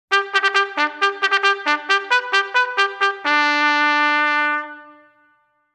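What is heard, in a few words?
mail call, Ceremonial Music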